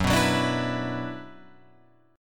Gb-7th Suspended 4th Sharp 5th-Gb-6,6,6,9,7,6-1-down-Guitar-Open F; strummed; JGuitar